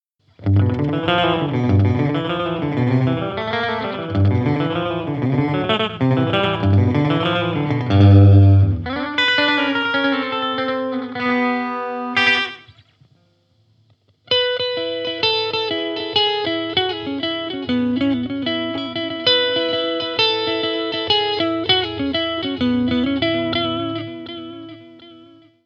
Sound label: nux frontline 15 echo and delay